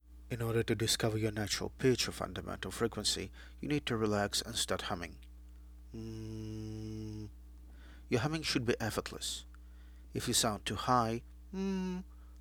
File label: humming